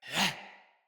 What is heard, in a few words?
Attack, Battle Barks; Envoy